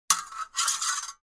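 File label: sound of blade against blade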